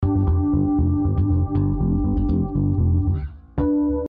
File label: G 120 Funk